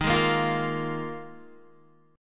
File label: Eb Suspended 2nd Suspended 4th, Guitar; Ebsus2sus4 strummed; JGuitar